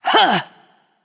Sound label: grunt attack; Smite; athena